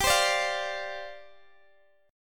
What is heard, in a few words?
G 7th Suspended 4th G down Guitar DADGAD